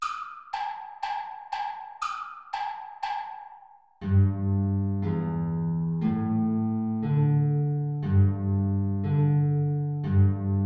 Hornpipe Altflöte Gitarre Play-along; hohe Notierung; The Belfast Hornpipe

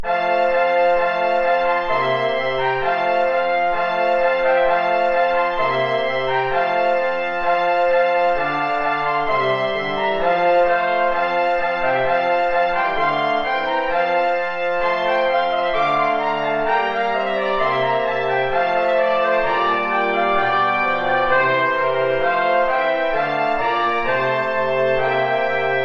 2 Trompeten in Bb (hoch) & 2 Posaunen; Hört, der Engel helle Lieder

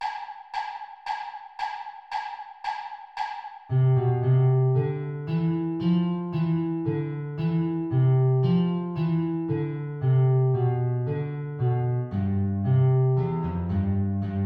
Air Altflöte Gitarre hohe Notierung Play-along; BWV 212-24

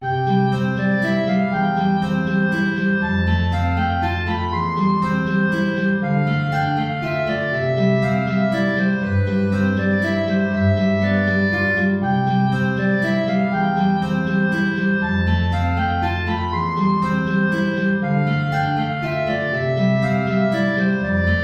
Menuet, Klarinette in Bb & Gitarre, hoch; BWV Anh 114

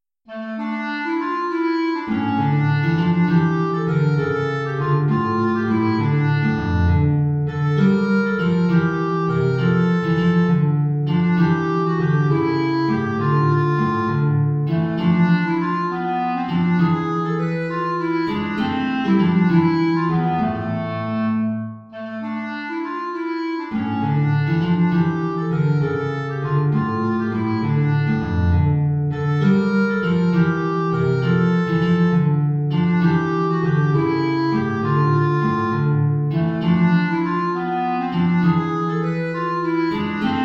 Siciliana (Klarinette in Bb & Gitarre) (tief); Opus 4-1-1

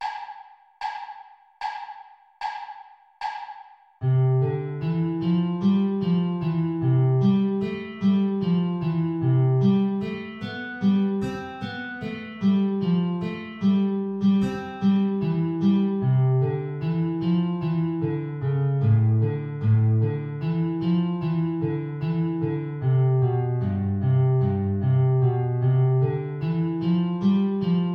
Arie, Altflöte & Gitarre, tiefe Notierung, Play-along; BWV 212-4

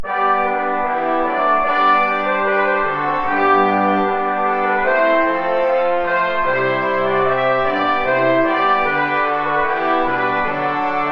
Ballet II (2 Trompeten in C & 2 Posaunen)

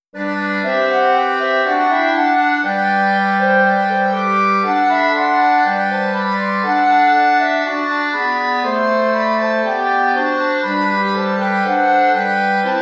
Bourree I (4 Klarinetten in Bb)

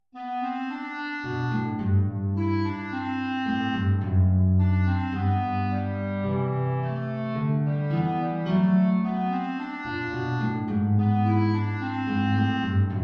Andantino (Klarinette in Bb & Gitarre) (tief)

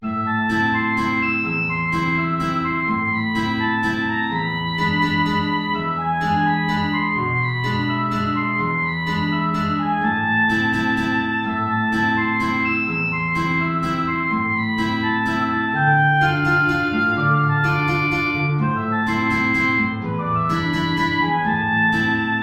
Oboe & Gitarre, hoch

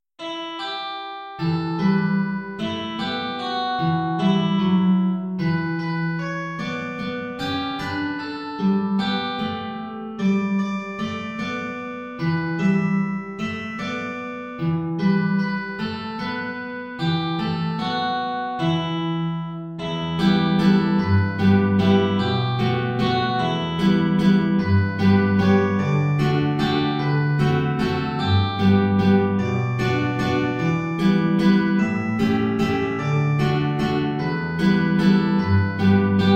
E-Gitarre Gitarre hoch; O Heiland, reiß die Himmel auf

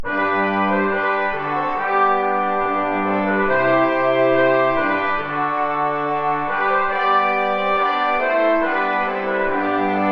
Ballet I (3 Trompeten in C & Posaune)

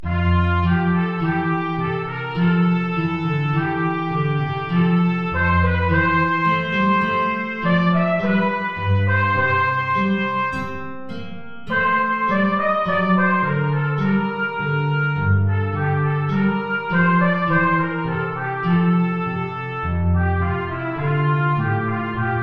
Trompete in Bb & Gitarre; Warum sollt ich mich denn grämen

